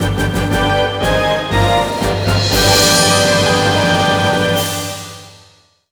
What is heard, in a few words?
Found It ~ Major Excavation Site [Jingle]; Drihoo